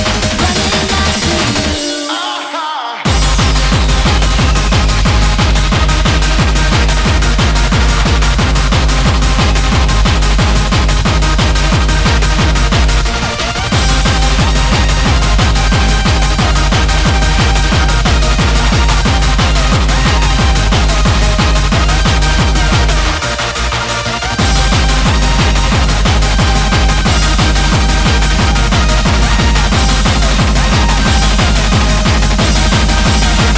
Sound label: Hardcore Mix